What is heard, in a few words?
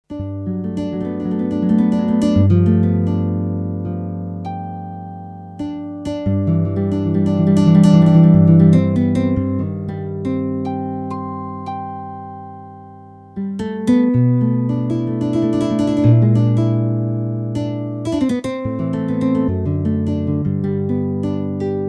flamenco